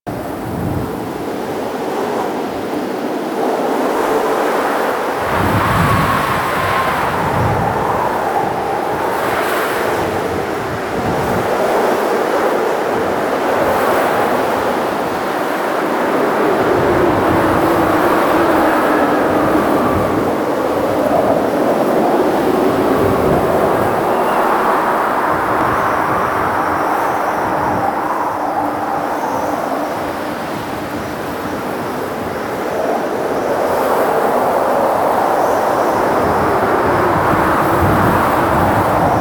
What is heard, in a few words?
Mountain Pass Heavy Wind Loop; Ambience; Warframe; The War Within